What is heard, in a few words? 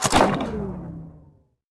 Powering Down